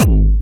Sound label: Jump, kicks